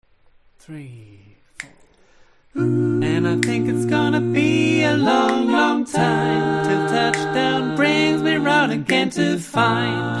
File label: All Parts, barbershop